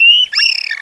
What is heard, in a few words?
Penguin